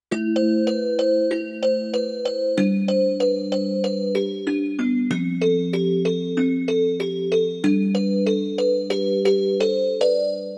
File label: MIDI